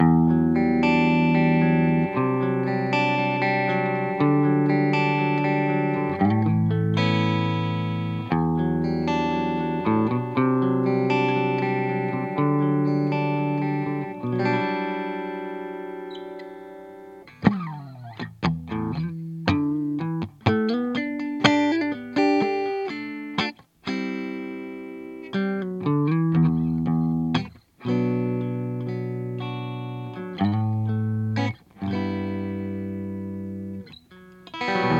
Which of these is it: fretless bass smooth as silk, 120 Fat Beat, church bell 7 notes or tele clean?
tele clean